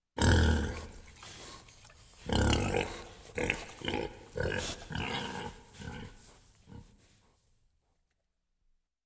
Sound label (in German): schwein grunzt und rennt weg; Auf dem Land